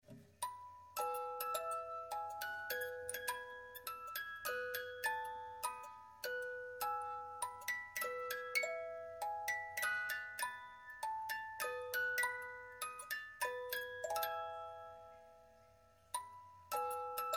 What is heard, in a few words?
aachen music